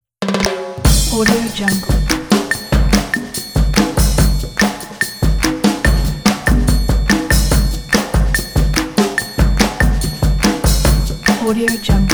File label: funky catchy drums and percussion